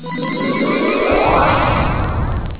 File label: space